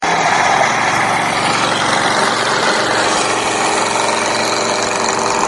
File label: Fengke Road and Liuquan Road Intersection